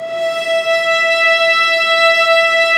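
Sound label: violas ambient p; Roland LCDP13 String Sections; STR Vas4 Amb p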